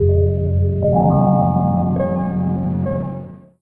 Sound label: Startup; Windows 94; Windows Never Released Soundpack 1; deviantart